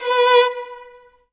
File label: violin